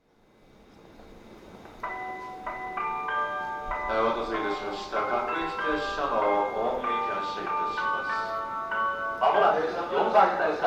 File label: ikebukuro melody; jreast; saikyo